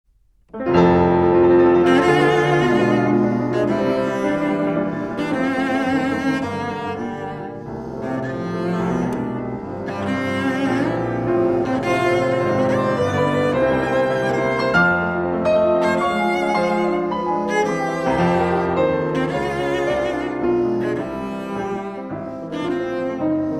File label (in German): violoncello klavier brahms; a huelshoff; p pagny; label novalis